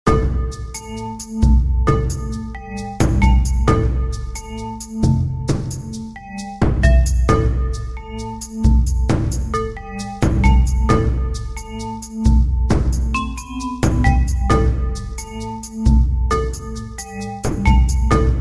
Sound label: Jungle; tales of monkey island